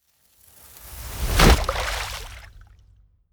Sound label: promo pad